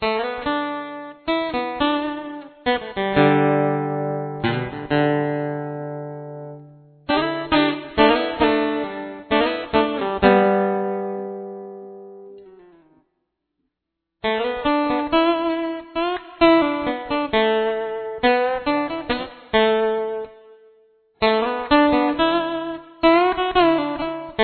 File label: g2 solo